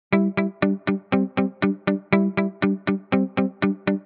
guitar mute

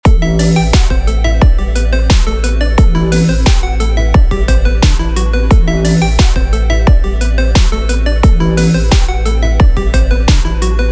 dark bgm dorian

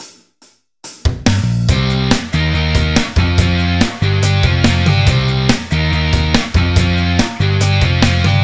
rock

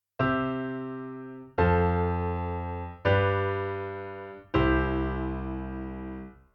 kadenz; chord functions

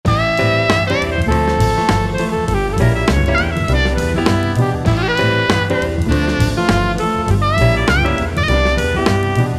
V dim jazz

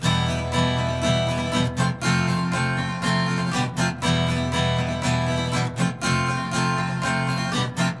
phrygian spanish

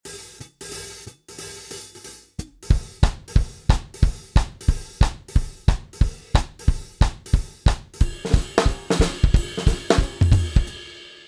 swing in jazz

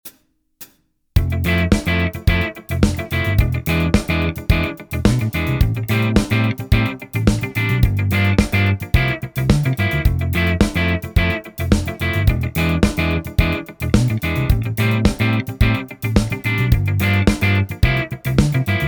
Transient good

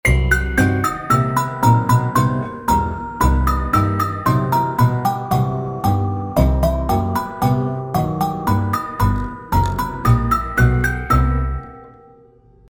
major chromatic tendency scale

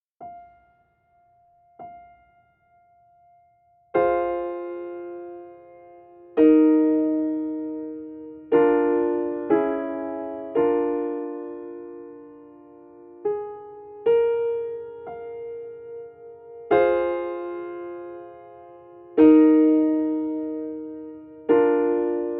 polychord upper

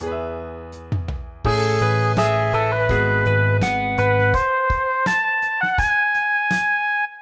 enharmonic in E major

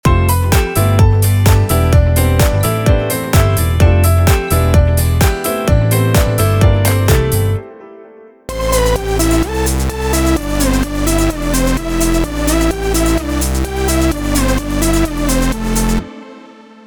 same melody different styles

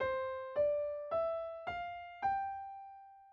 doremi

